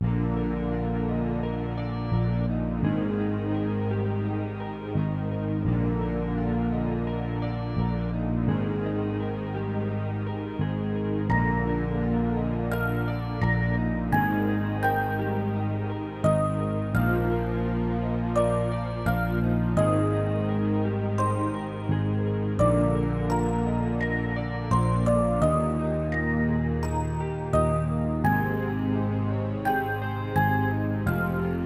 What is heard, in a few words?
Story Dialogue (Sad Story); Shinkon Gattai Godannar PlayStation 2 gamerip